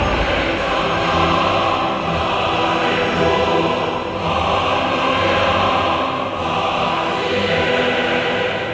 fall

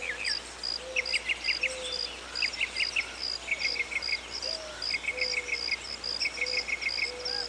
Birds; de boondocks

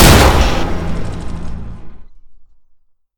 propulsion fire; deluge; weapons v3

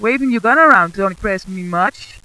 dead girl

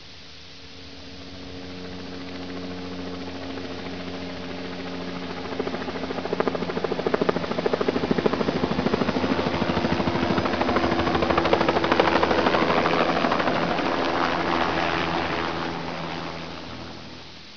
copter up

